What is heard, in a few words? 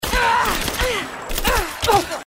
taking damage; rise of the tomb raider; lara